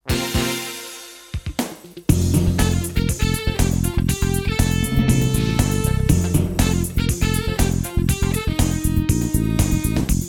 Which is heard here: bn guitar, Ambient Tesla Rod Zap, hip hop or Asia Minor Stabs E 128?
bn guitar